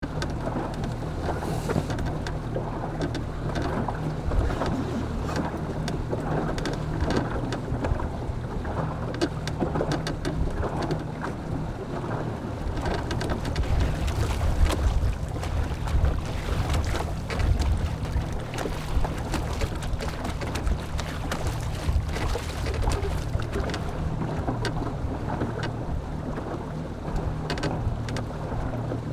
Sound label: Boats sit idle in a marina waves crushing loop